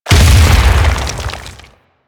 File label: Barrier Collapse; Warframe; Brawler